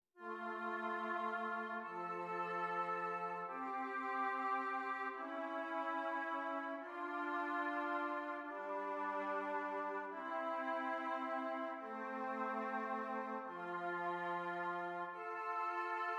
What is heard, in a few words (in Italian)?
esercizi di contrappunto semplice A due voci; Ronge